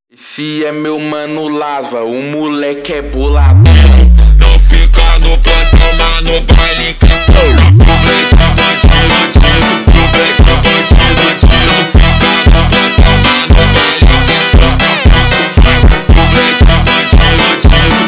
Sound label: Funk slowed, funk